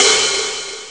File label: hihat op; Amiga Soundtracker Sample Packs